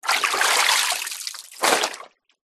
leisure lilo flat uninflated lift out of water and down on ground